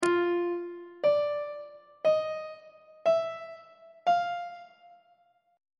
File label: f 7th interval pitches on treble clef